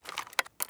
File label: pickup quiet